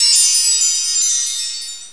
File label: warp; Active Worlds; mall